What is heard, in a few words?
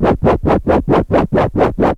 drumbreak, 130